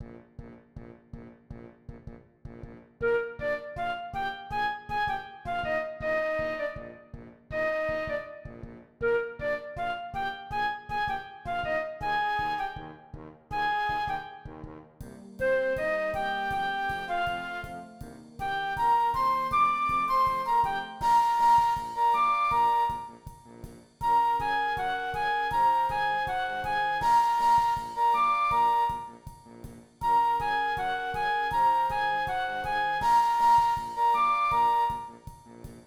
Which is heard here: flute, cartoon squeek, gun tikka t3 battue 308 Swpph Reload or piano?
flute